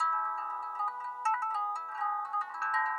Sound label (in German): Hals